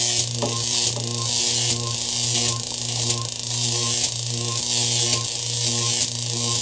recharge; Electricity; Electricity Game Sound Pack

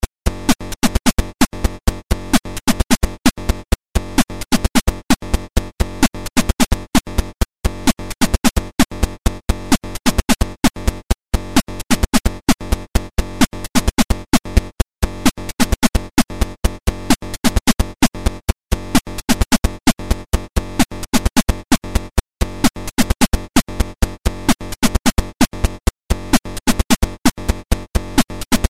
electro mosaic PSG

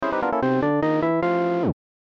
game over

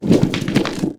ability stone prison up

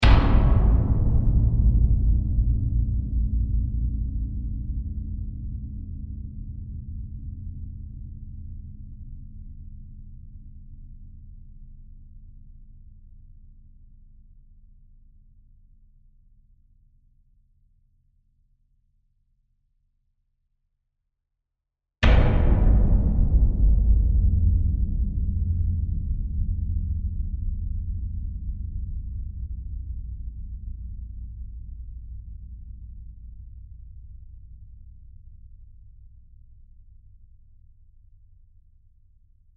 Dark Long Hits

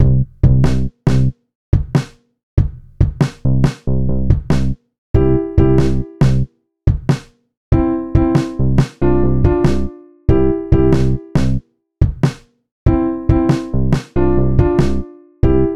relax background

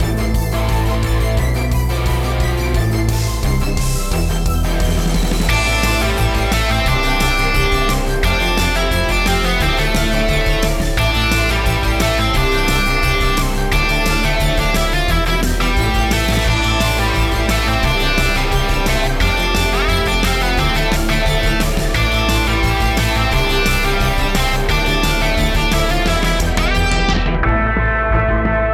hot roadway climax remake bpm175

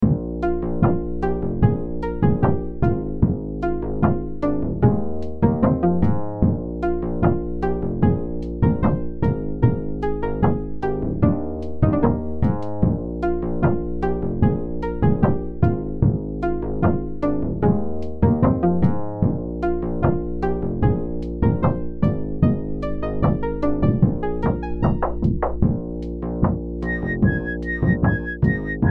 dancing robot

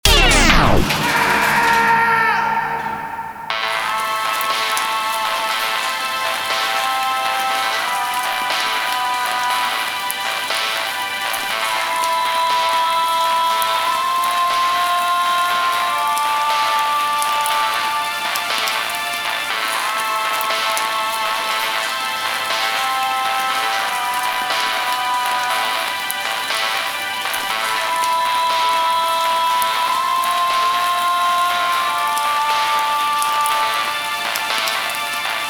death intro, zombie soundtrack; unreleased

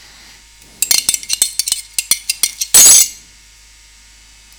stiring cup

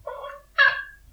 cat mew food

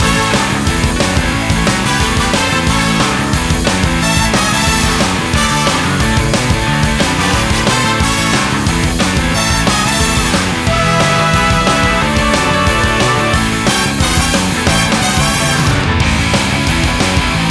concert loop, zombie soundtrack

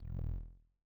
bat

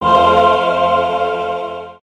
aah

